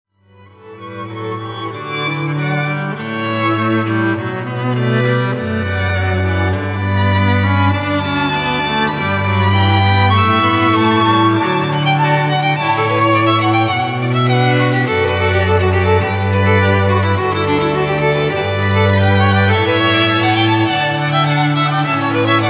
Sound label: strings